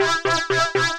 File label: Metal Plucked 8ths; phet io wrapper sonification; ohms law